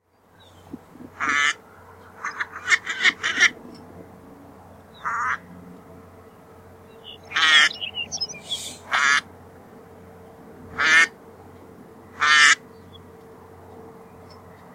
Stjartand

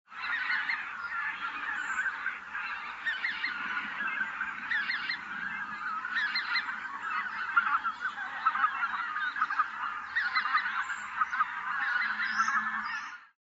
Fjallgas

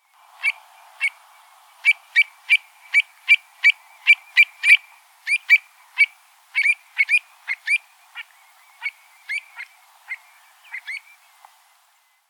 Skarflacka

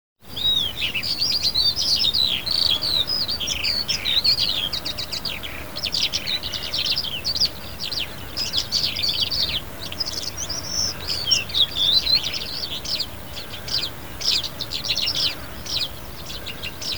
Kalenderlarka; Arne Thelin

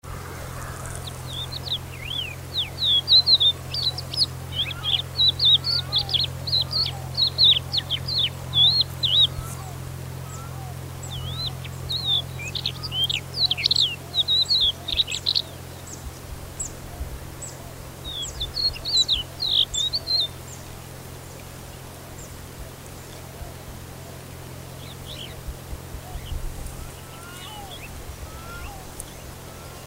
Tofslarka

